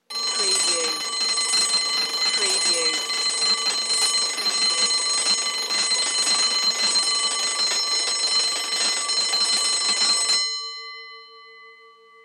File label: Electric School Bell Constant